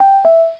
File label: user alert; IGO; android